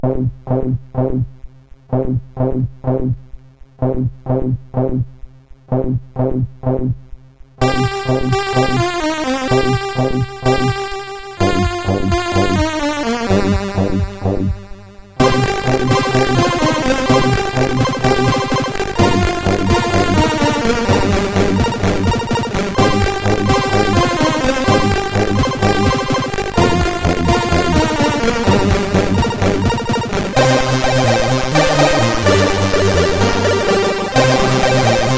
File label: Music Samples, sid, CSG8580R5